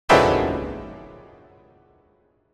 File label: Piano Harsh Jarring Chord; Hollywood Edge; sound designer's toolkit v2